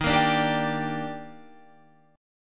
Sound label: Eb Major 7th, Guitar Drop D